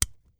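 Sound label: foot pin click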